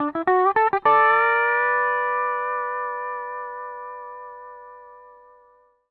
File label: 105 L, PD LOOPS